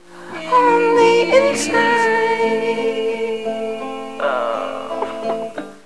para 8bit

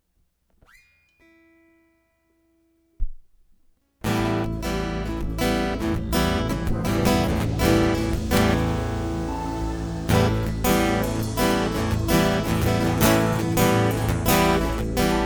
Instrumental

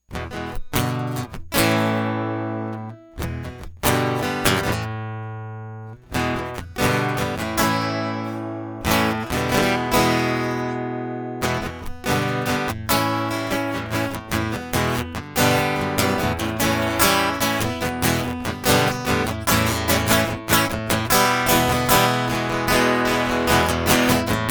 Guitar Loop

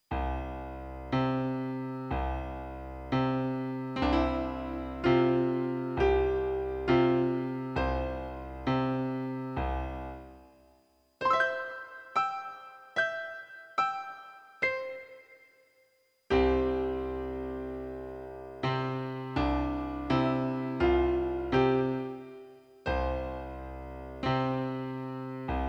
Piano Sorrow Instrumental

